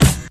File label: dry hip hop acoustic snare sound c sharp key